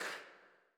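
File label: choir "K", Women; Sound & Vision - Gigapack I CD 2 (Roland)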